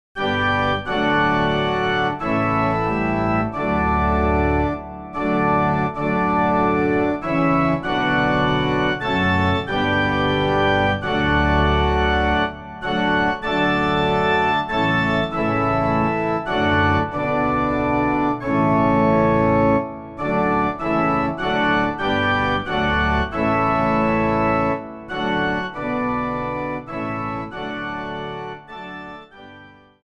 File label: inst; Kirchenlieder; nun ruhen alle waelder